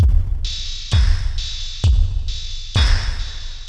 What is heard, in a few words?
Octave Down Loop 130BPM, DrumLoop Ghost Layers; layering